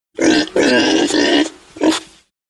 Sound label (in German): schweine grunzen als ringtone